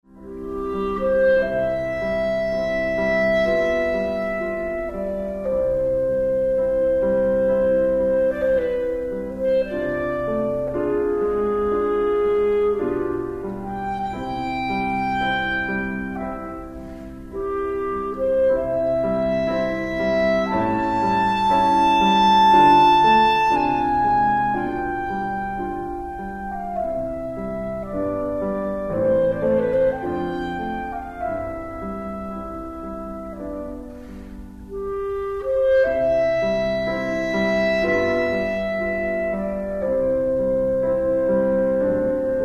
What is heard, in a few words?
concerto for clarin; weber; Concierto para clarinete